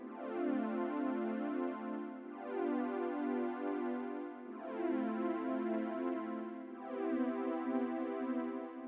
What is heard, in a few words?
stem chords, music loop; rust